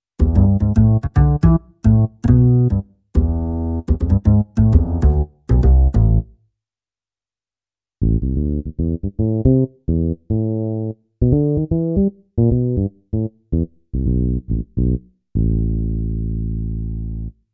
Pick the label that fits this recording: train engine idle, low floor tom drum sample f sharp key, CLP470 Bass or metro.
CLP470 Bass